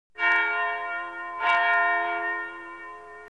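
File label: Church bell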